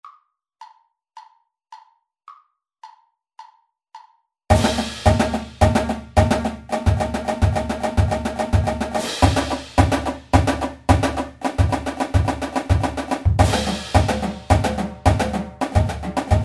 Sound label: flams, Percussion